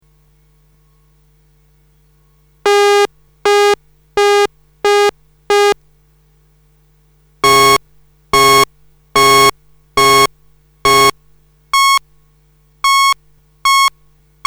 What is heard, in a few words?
polysix Distorted; Polysix demo